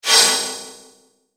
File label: multimedia rollover, Multimedia Rollover Tone